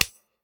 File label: clap; smite; god tiamat